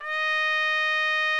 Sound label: TRUMPET, SECTION LOOP; Best Service; Killer Horns